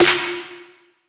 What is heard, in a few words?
Light Snare; Cymatics; Light Free Sample Pack; Light Samples